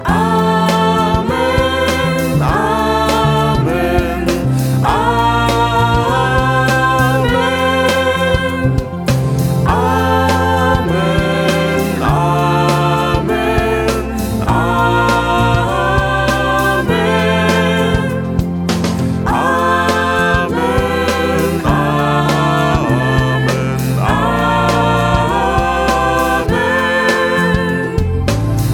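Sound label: Amen canon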